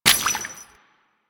Steps Water, footsteps; Warframe; Grineer Royal Jester; Foley

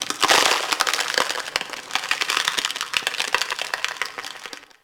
Landscape Ice Crater Creak, Ambience; Warframe; Venus Open World; One Offs

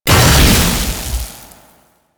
Loot Crate Energy; Warframe; Entrati

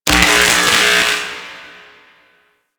Infested Light Flicker; Warframe; Corpus Infested

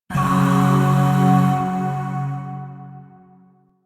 Shawzin Chromatic Chord D; Chords; Warframe; Zariman; Void

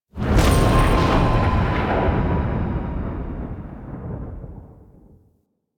Ghost Spawn In; Warframe; Priest Quest; Rell Ghost

